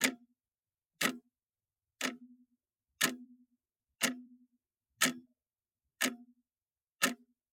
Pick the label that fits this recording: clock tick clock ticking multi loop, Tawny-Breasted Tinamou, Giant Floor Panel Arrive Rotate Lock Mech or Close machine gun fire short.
clock tick clock ticking multi loop